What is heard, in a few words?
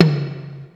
Tom, Yamaha SY22